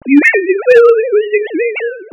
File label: sine